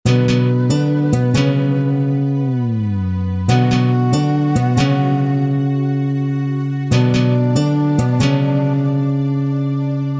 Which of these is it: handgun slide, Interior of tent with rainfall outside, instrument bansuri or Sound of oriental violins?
Sound of oriental violins